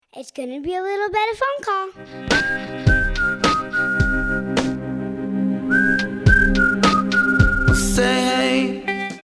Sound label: ringtone